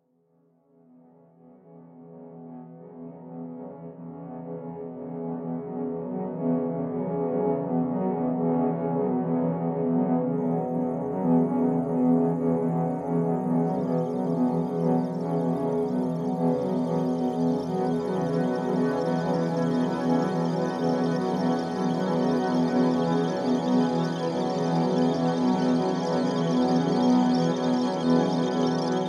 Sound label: pollution data sonification